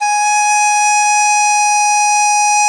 DIZI G#4, Winds; E-MU Producer Series Vol. 7 – Old World Instruments

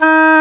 Oboe D'amore; Roland L-CDX-03 Disk 1; Oboe 4-8

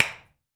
finger pops

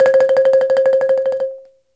BALAFON

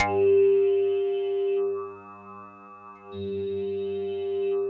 AQUAMARIMBA G1, Yamaha VP-1; Music Store; 90's Sample CDs

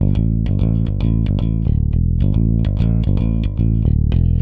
Rock, Bass; Bass X

